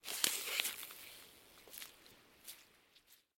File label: Ice crackles